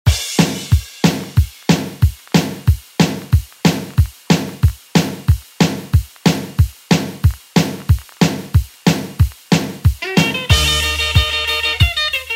60s Re Drum; DJ Mhark

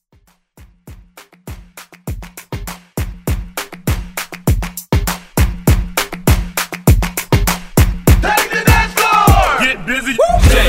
Throwback Cutz

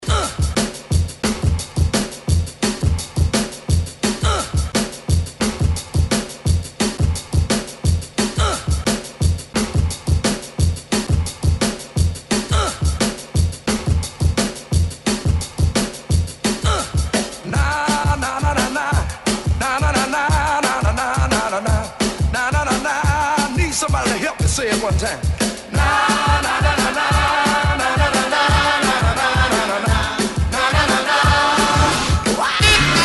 60s ReDrum 87Bpm; DJDOLLS

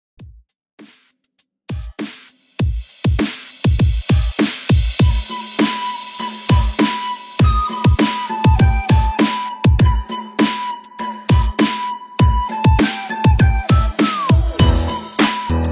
TWERK 100 BPM; SQUID GAME

